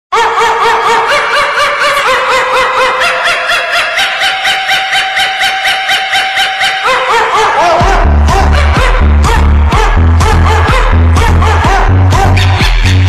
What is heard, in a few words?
dog song dog music